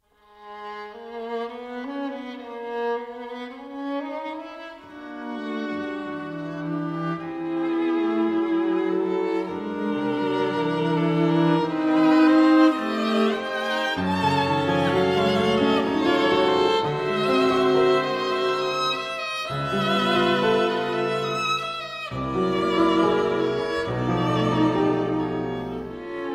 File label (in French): Quatuor pour piano, violon, alto, violoncelle, Larghetto